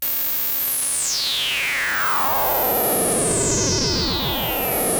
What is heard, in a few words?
Moog C#4, rare synthesizer; Hollywood Edge; Giorgio Moroder Rare Synthesizer Collection